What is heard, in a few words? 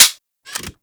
Fire Mech, Rifles; Snipetron Vandal